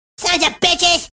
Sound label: sob; Furby; Hacked Hot Wired and Channeling Cartman